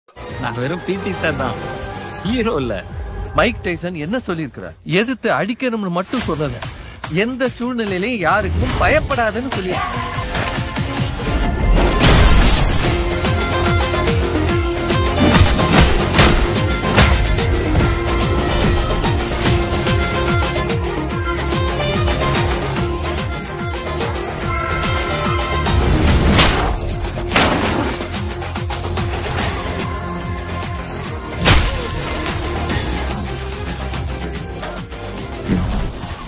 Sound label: trailer bgm ringtone